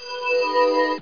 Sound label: Transport; gubble